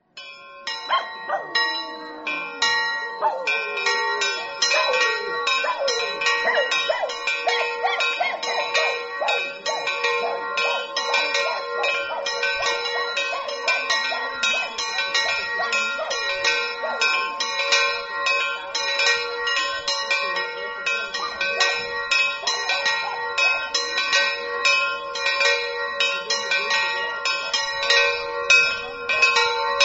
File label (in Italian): campane, Pitassi